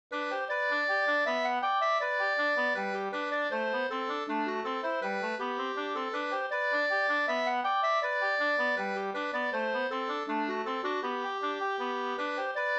ganglat; e Carl Erik i Lind Skoldinge

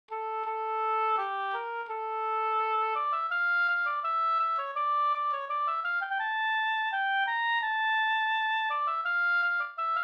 brudmarsch; fr Edsbyn

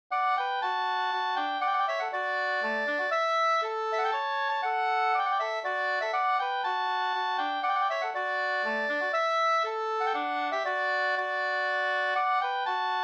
polska Knapp-polska

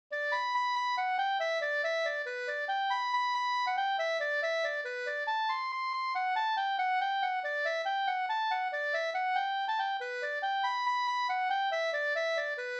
mazurka; storing e Karl Nilsson Karlskoga Vr BgdINp50

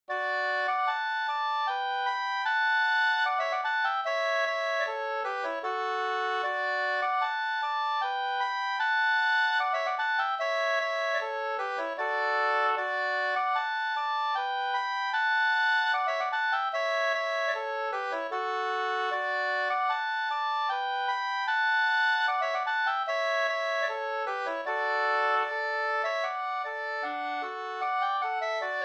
ganglat marsch; e Jan Calsa Vr 2st

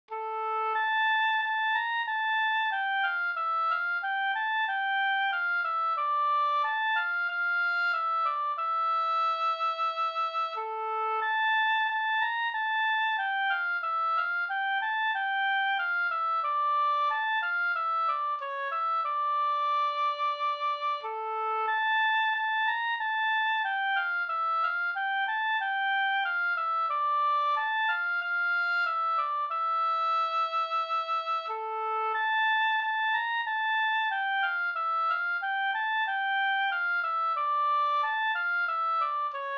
ganglat Brudmarsch; Skraddar-Edvards ganglat fr Ludgo SvL

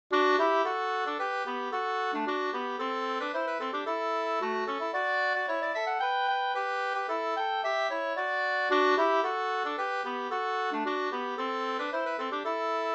polka onestep, 2st; Jeppo-smetton fi

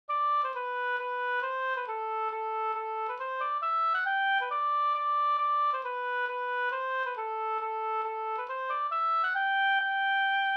mazurka

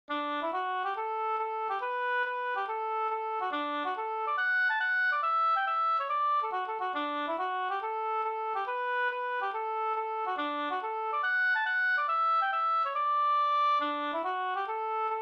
schottis; Hinkes schottis Mp

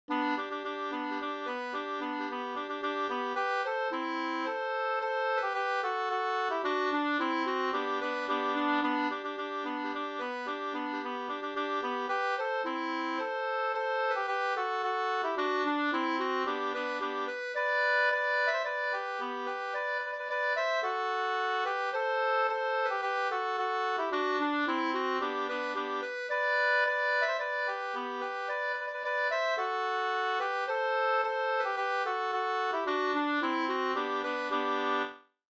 polka; Bjorklunds polketta Alingsas 2st

